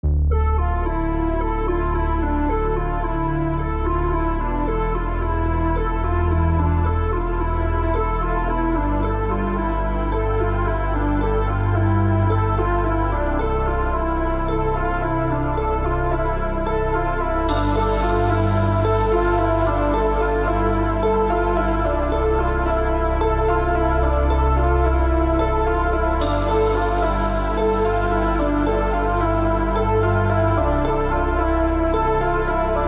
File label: ambient; Qwillance